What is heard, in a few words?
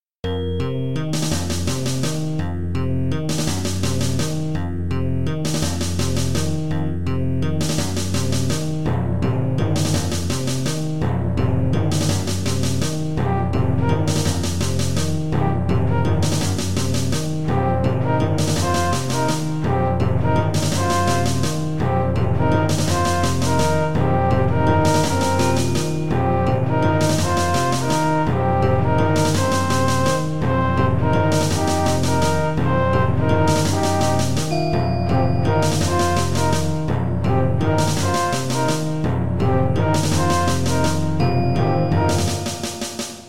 Ambiance OPL